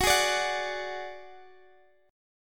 Gb Suspended 2nd Sharp 5th, Guitar, Half Step Down